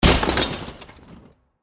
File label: Massive Impact With Various Elements; Burst; Impacts; Crafted Signals